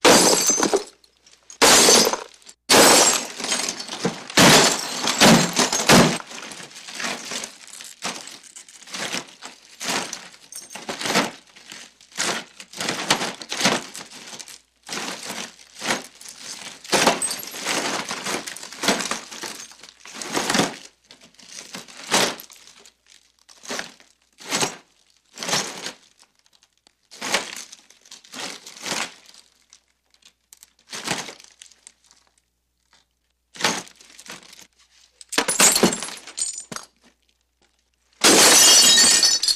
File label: Kicks Soft Hvy Glass, glass smash